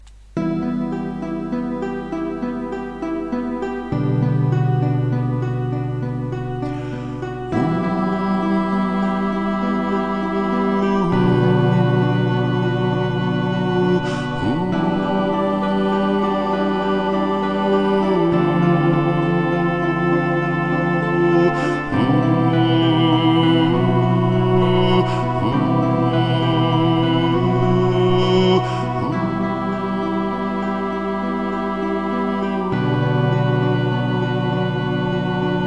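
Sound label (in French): Agnus, soprane; Plassard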